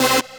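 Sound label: Rave Synth, Dance Mega Jungle Rave; Best Service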